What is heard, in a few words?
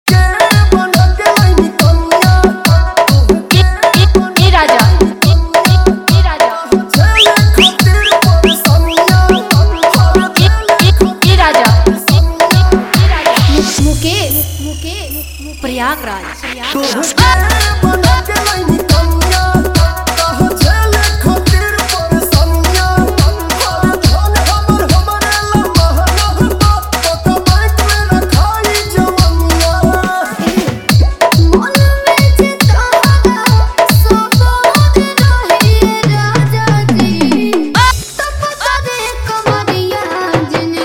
Bhojpuri Dance Remix